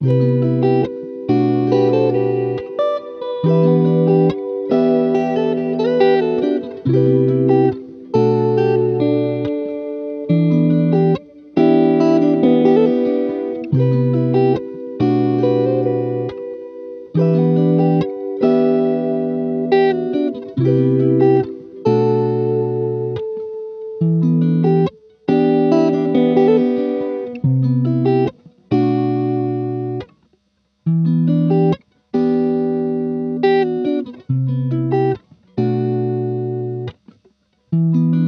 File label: Playground, 140 BPM E Min, Guitar Loops; Cymatics; Journey Guitar Loops